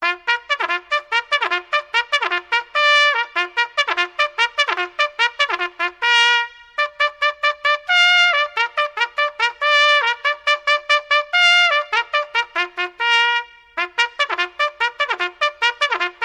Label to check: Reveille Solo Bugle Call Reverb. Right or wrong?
Right